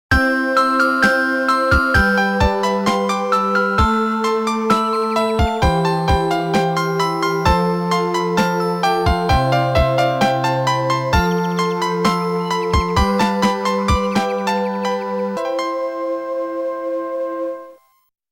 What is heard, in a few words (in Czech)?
melodie